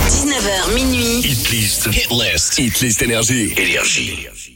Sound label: Acap; NRJ